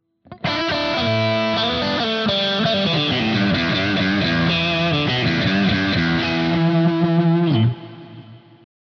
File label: MUSIC MAN L3 crunch 4th position